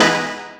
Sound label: DR. LOOP; X-Static Goldmine 3; CHORDS